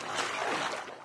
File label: wade, footsteps, run; Left 4 Dead 2